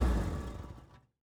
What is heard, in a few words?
vehicle suv turnoff; teardown; ps5 windows xbox series xs